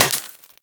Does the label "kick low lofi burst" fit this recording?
No